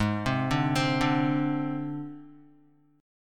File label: G sharp Major Flat 5th, Guitar; G#Mb5 arpeggiated; JGuitar